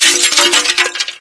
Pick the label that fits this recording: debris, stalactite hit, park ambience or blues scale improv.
debris